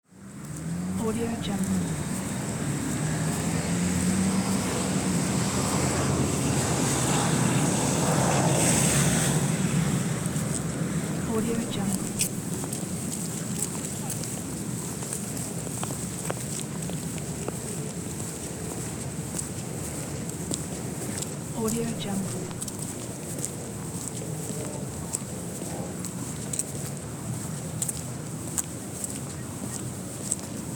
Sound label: walking along busy street